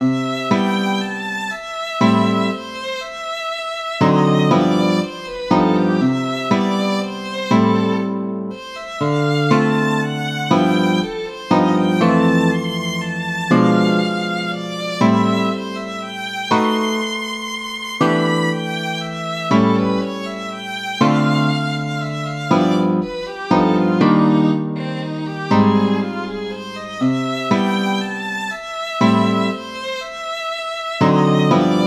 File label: Jazz Waltz